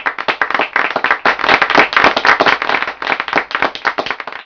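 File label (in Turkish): alkış, insan; efekt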